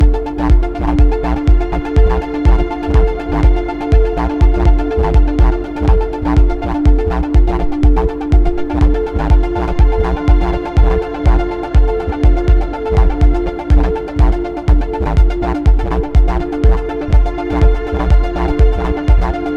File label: string arpeggio fx